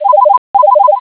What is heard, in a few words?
ringer